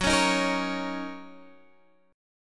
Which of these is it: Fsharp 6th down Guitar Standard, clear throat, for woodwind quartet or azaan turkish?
Fsharp 6th down Guitar Standard